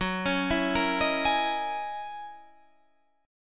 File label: F sharp 13th F sharp Guitar Standard; arpeggiated; JGuitar